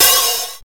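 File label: Linn 9000 Open Hi-Hat; Drum Hits; Nu Dance Electro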